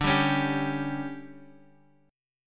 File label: D Major 7th Suspended 4th, chord